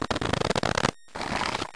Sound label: Shuffle-riffle, Cards; Garden Chance Cartes 1.0.3